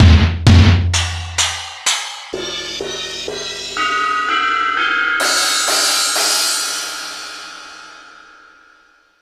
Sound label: Mega Drums, Drum Machines